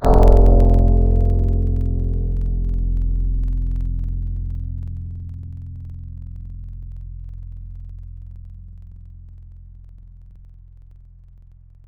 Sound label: Kora; Synthetic's Serum Collection; Echo Sound Works Sphere